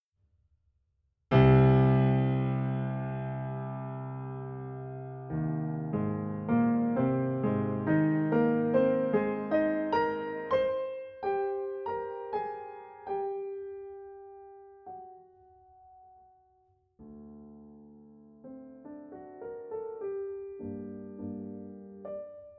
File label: ballade snippets